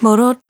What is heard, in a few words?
Red Nucleus, Single Voice Melodic Loops; Singles